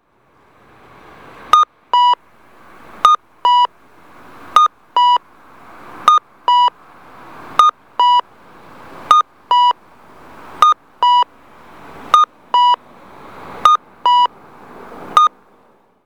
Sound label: kakko, onkyo kosaten; nisshin EA9101D; okayama; kokuseijikita; naka; WE